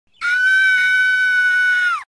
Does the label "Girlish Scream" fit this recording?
Yes